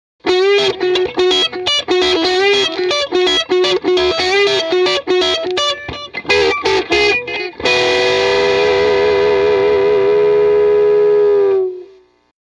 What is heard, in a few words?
tele midposition dist jtm45 country lick